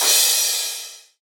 crashes

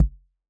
Kick

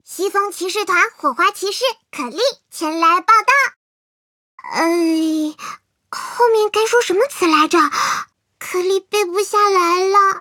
applause

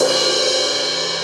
Crash medium

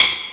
Hit Drum

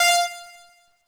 normal hitwhistle

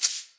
shaker; osu

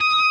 Left-hand vibrato

